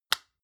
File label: lotion large lid close hard; berufe; friseur